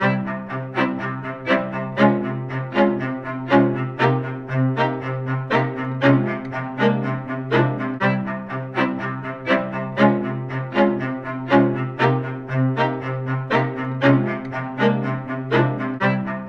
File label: Strings dry